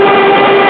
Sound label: dive loop; falcon